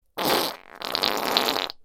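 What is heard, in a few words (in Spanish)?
pedos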